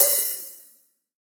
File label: Acousti Hat Hit, hihat; ultimate hihat; Hits